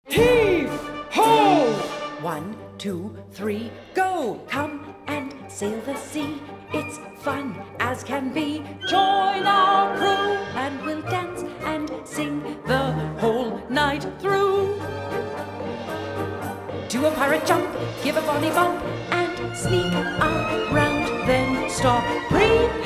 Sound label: Persian March Vocal